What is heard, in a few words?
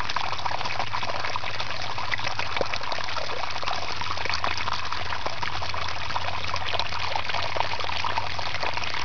pump water